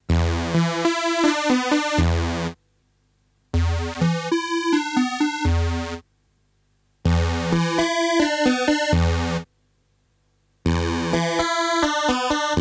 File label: Selected Waveforms